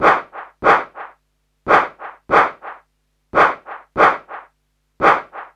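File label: DRYAD snare drum, drum loop; Big Fish Audio; Uncivilised G'rooves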